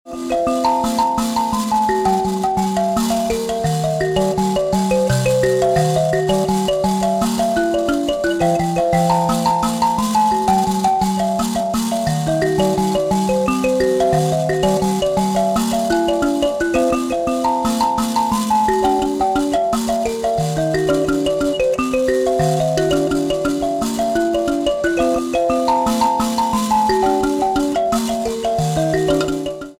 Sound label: mbira